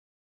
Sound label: dog